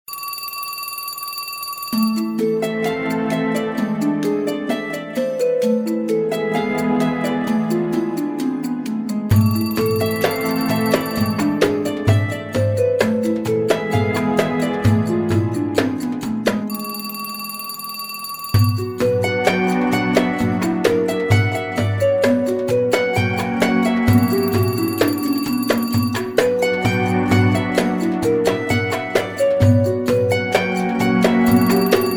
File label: Arabic; Randezvous; LG; SmartWorld Soundbox